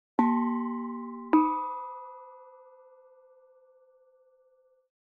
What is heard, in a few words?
kethuk